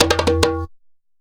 SABARO ROLLS; Best Service; World Colours

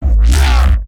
Reese; neuro; BIG5AUDIO

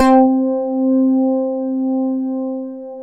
DW8 SBS C4, Korg DW8000; Back In Time Records; Korg Universe VOL-1

